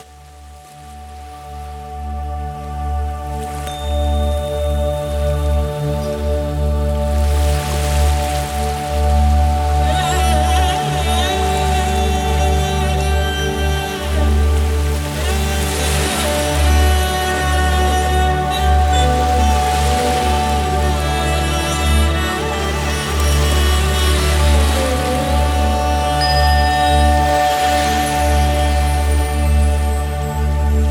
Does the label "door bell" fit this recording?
No